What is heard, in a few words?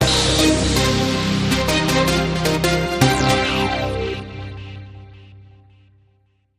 Light Sonic